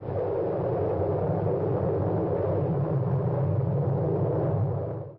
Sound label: tornado release; tuxpaint; magic